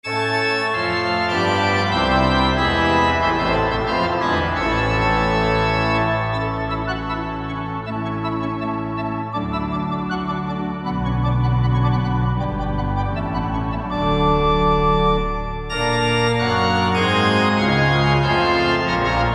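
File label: Organteq New Year Fanfare, Ptq New Year Bells; Organteq ( + Ptq New Year Bells) New Year 2020 Fanfare; Pianoteq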